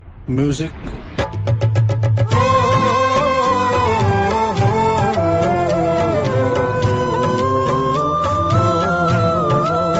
sad music indian

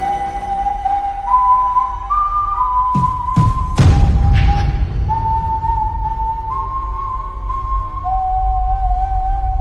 death whistle; puss in boots the last wish

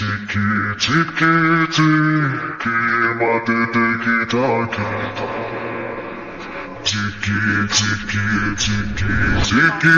very tuff music

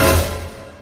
suspense strike

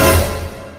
vine dramatic surprise

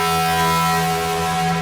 random button

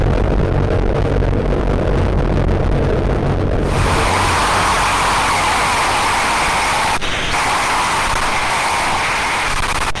doors

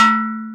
metal hitsound

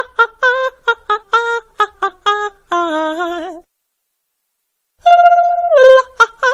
hahaha blblbl

